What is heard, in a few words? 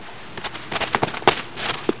push box, debris; cstrike